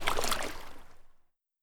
Water Splash Soft

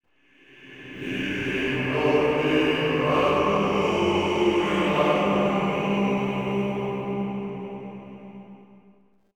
Door; Forgotten Realms Demon Stone; PS2

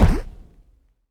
Impact Small

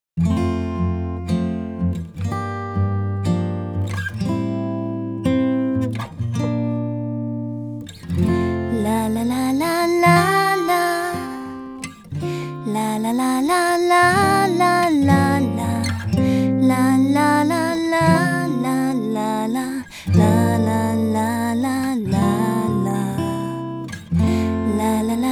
Acoustic; infinity nikki game original soundtracks vol15

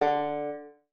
Banjo Note; Bendy: Lone Wolf

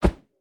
Swing Tiny

